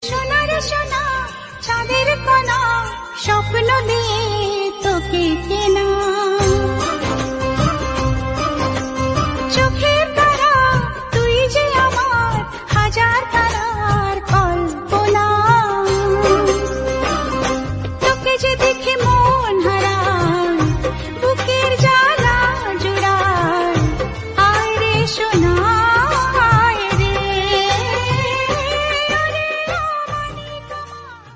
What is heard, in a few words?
Bengali Female Love Song Ringtone